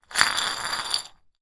broken glass pieces inside glass jar spin concrete; zapsplat